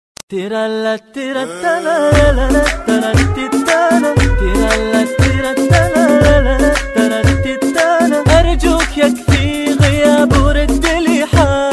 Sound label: arabic hiphop ringtone